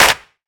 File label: hand clap d key, Royality free hand clap tuned to the D note. Loudest frequency: 3227Hz; percussion; single hits one shots